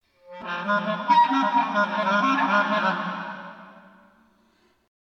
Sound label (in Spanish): clarinete frase